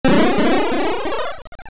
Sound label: Portal Out; Stepping into and out of a warp portal